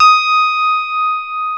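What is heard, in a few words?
D#6 DX RHODES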